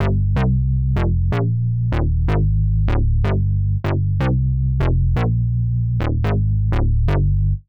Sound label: Synth Bass A, 125; PowerFX - Nu Jazz House